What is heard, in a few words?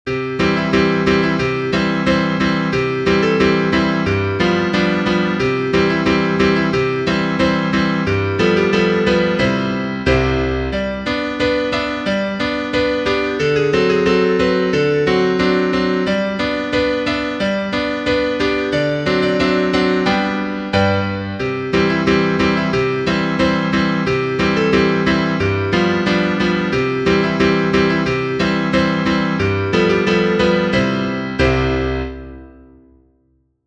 piano